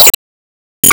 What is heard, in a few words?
Ingame Score Top; disney star smash android ios mobile gamerip 2020